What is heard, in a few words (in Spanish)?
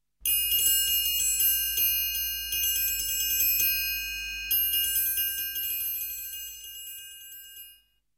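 Triángulo, Percusión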